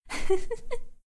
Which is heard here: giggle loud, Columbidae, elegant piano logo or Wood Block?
giggle loud